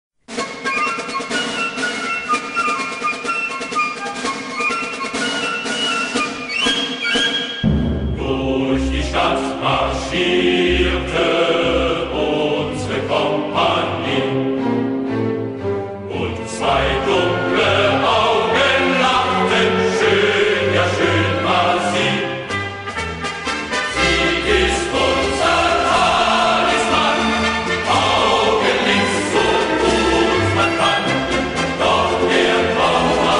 German Military March; WWI; Gradeaus

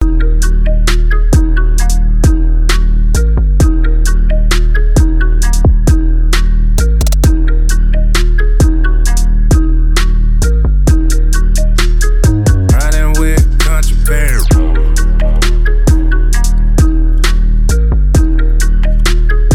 FREE BEAT

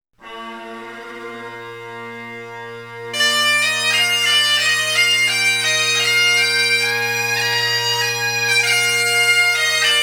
Highland Bagpipes, Joy To The World

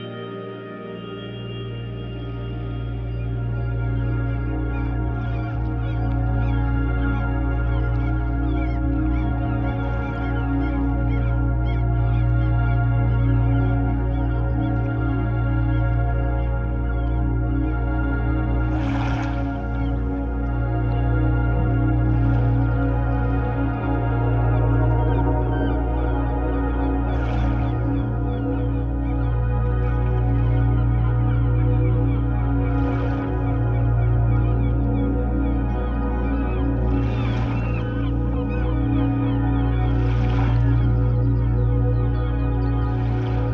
Sounds of Nature White Noise

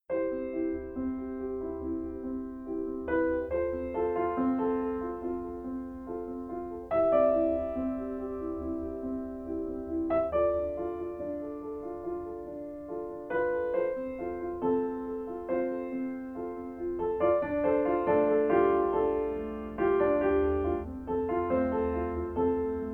Piano

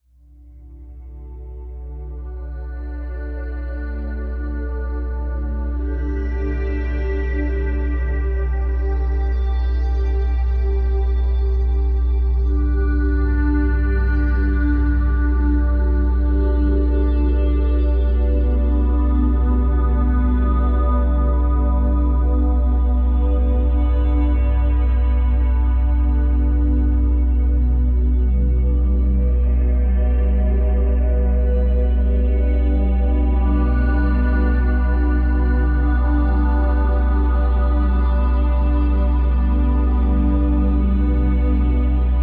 61 5 Hz

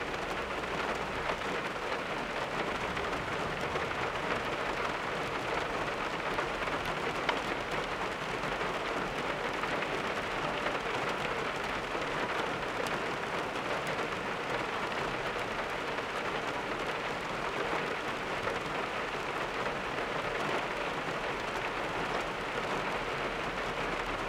Driving in the Rain

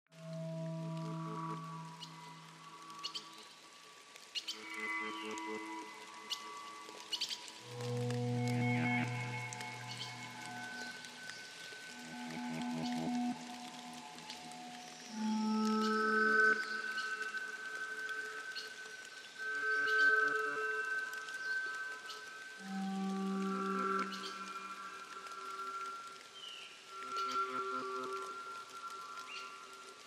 Rain in the tropics

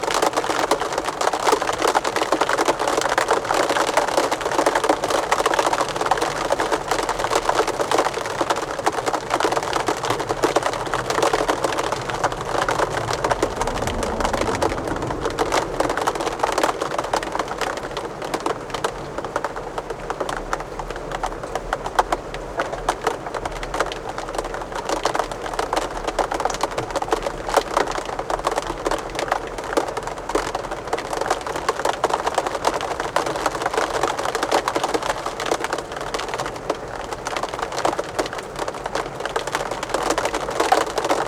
Falling Glass Rains

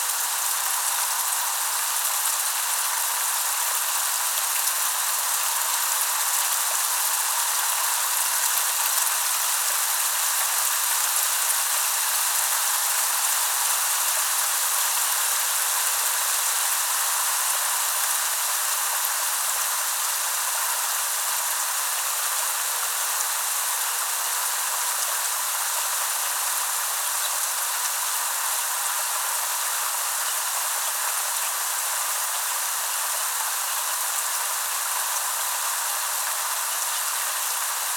Rain Sounds Nature Sounds, Heavy Storm Rain Sound

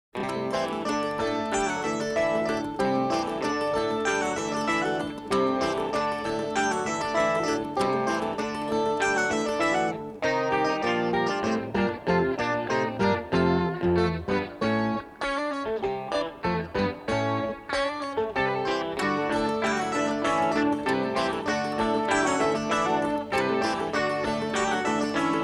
Guitar Orchestra

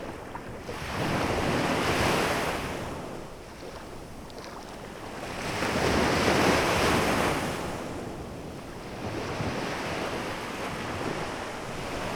Incredible Cay Beach Recording

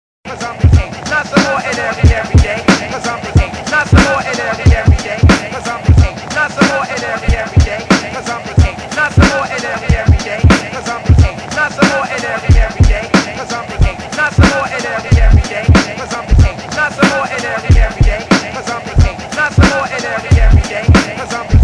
old beat

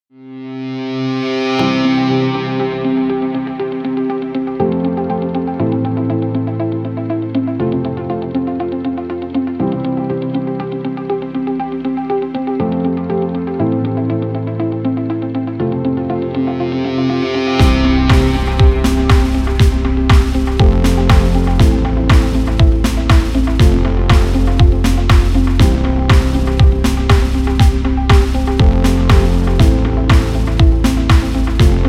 Motivation Ambient; LightStockMusic

